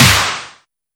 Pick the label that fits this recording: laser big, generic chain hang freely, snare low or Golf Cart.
snare low